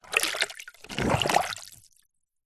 foley liquid plastic item movements in plastic container of water plunges